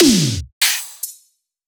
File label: More Drums